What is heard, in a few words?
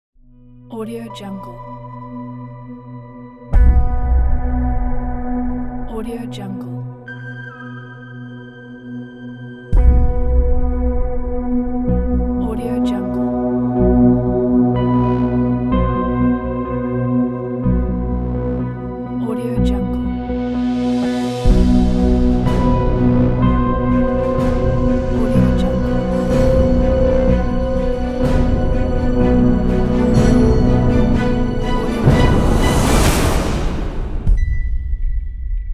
epic cinematic trailer ambient; denaks